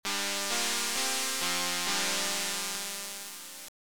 Transfer Beeper; The Sentinel

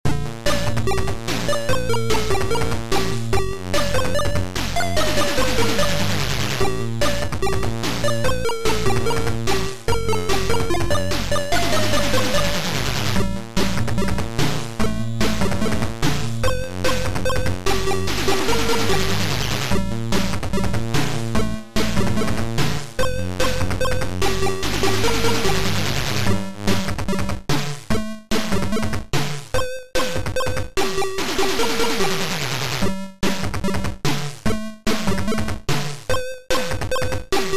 techno; unfuck

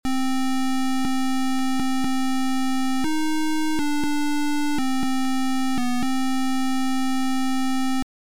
Title Beeper; Sir Lancelot

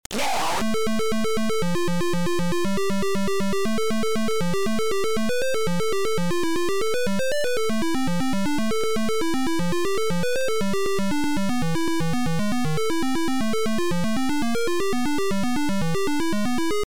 Intro, Sample, Beeper; Timothy Closs; I Ball

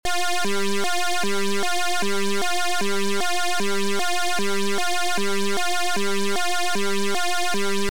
Title Beeper; Crime Busters

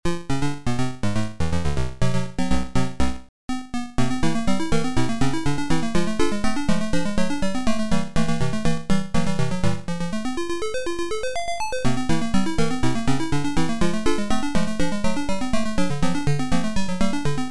Jazz

